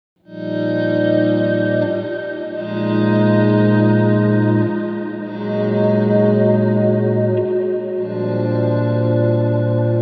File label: swell; BigSky; reverb; Rug